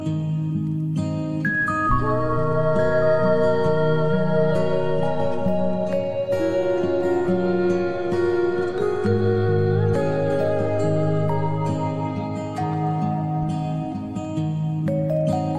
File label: Lullaby